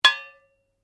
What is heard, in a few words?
Blade Heavy Impact, Sword; Foley sounds for melee weapons; Combat